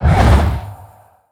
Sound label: orb off; weapons; dota; medusa